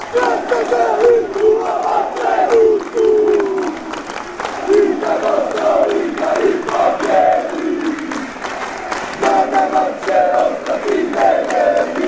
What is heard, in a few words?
clapping chant